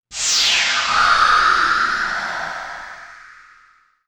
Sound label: heal wind